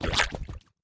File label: Meat Jump; A Robot Named Fight